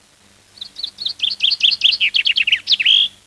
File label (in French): pinson gresigne bec plus epais